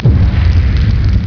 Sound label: ignite; mk2